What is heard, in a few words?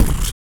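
vox percussion, Vocal Planet Jazz FX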